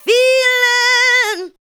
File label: ADLIBS